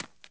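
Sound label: run left light, dirt foot; The Elder Scrolls III Morrowind GOTY; XBOX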